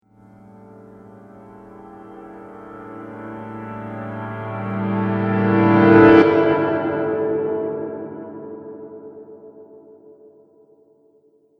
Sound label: Riser Reverse Piano; Rise And Dive Sound Effects